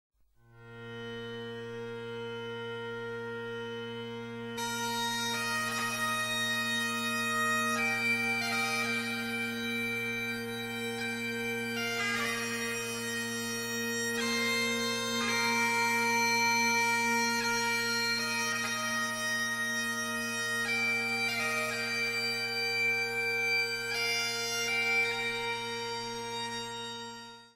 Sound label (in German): Dudelsack